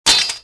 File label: bounce, saber; weapons